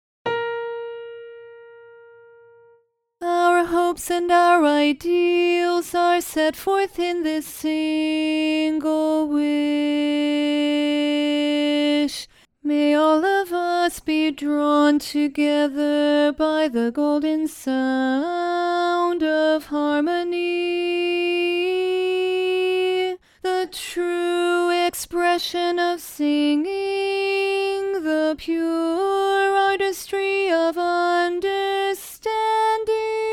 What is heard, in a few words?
learning track bari alone